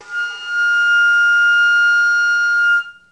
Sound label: F sharp 6 baroque, flute